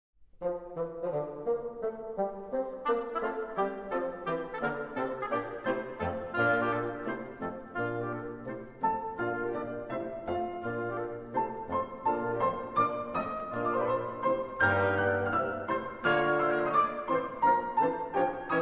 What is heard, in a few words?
Piano Concerto No. 2 in F Maj